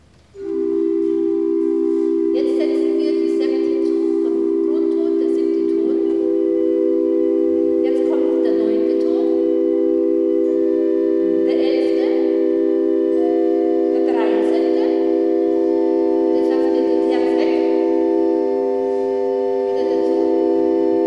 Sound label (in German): orgel moderne tonleiter